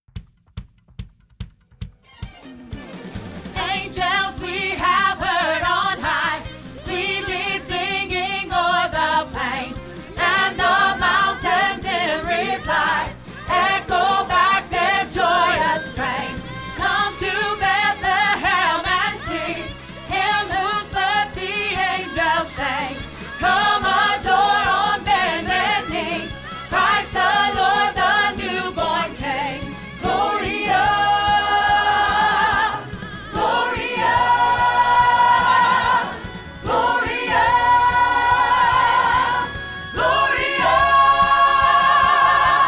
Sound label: Gloria Reprise Sop; Christmas 2015